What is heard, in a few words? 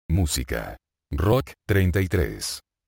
rock